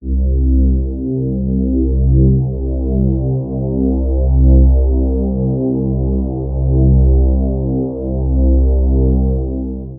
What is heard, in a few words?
Ocean Pad; Dr. Fink's Funk Factory